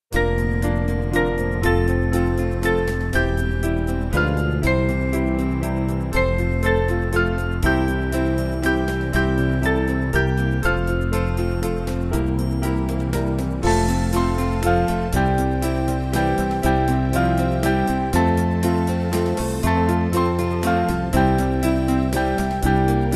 Band; Holy Bible Book Divine; Alette

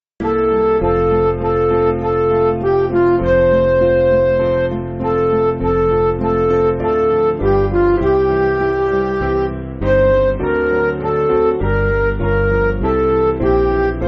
My Spirit On The Care, Midi

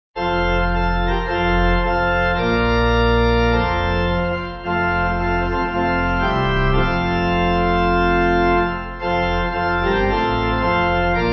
Work For The Night, Pipe; Organ

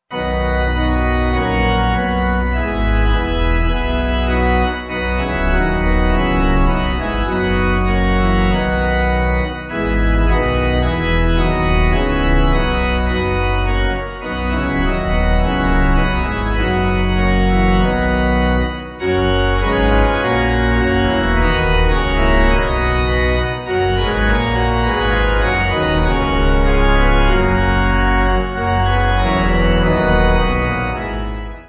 Pipe; Christ, the Lord, is risen today, Alleluia!

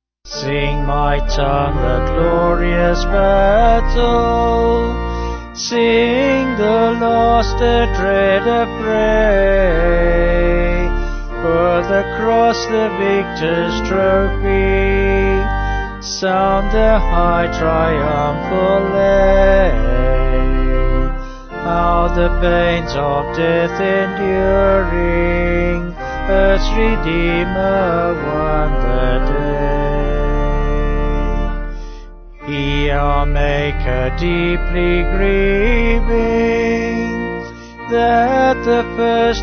Sing My Tongue, Pange Lingua, Vocals Organ; 6/Dm; Sung Lyrics

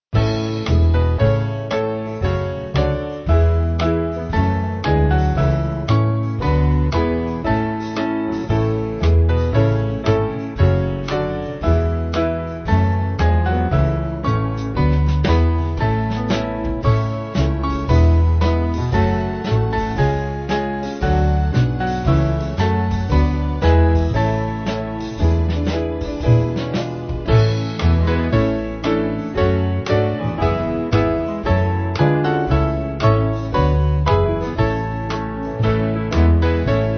Band

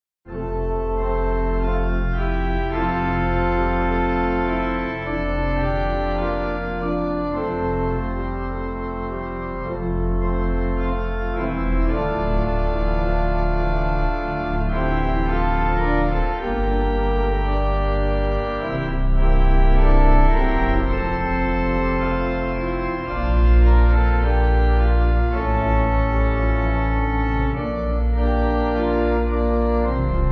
Pipe

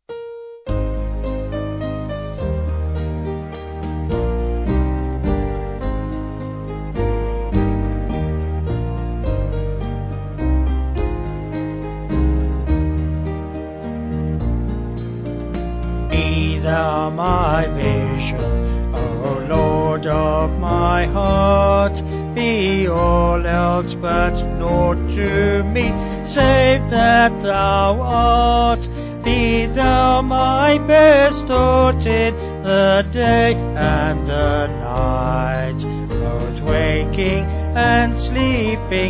Vocals Band

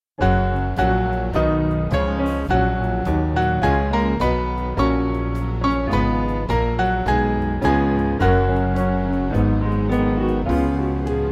Father Hear The Prayer, Sussex, Piano

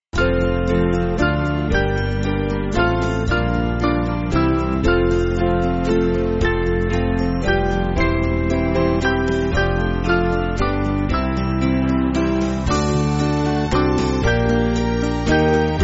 Band, Music Backing

